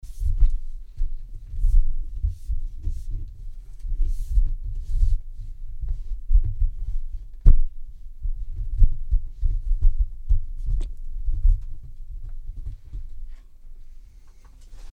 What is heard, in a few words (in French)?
Defaut sonore bruit de perche